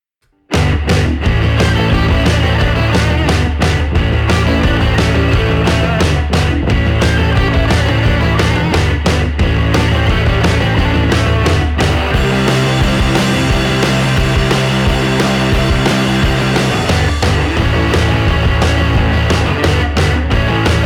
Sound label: instrumental